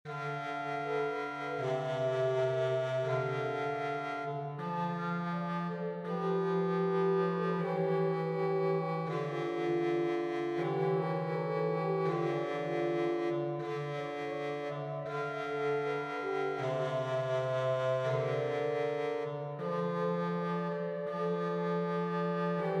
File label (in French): Hymne Assomption Trio, OrganMusic; Beauvarlet Charpentier Journal; Souvigny